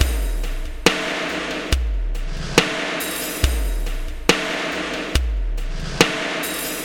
SloMo Beats, Loops 140 BPM

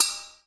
BELL, JAPANESE

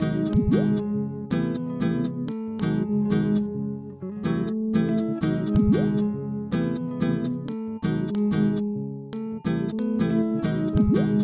Melody

